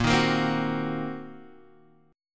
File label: C Minor 7th Flat 5th Bb, chord